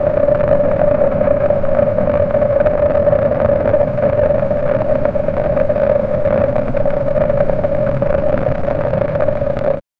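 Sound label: machinery hum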